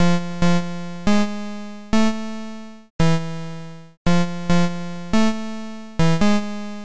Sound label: C64 Synth